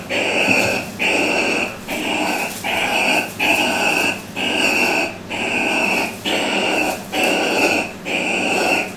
Bettongia penicillata defence

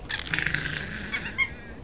Cuon alpinus copulatory

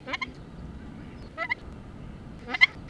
Branta sandvicensis loud call

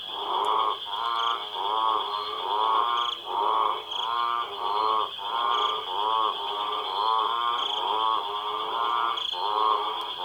Amphibia chorus Namibia

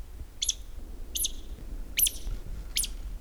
Ochotona alpina alarm

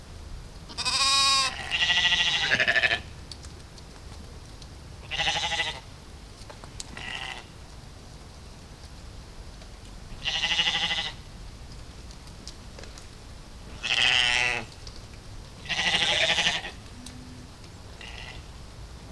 Ovis orientalis

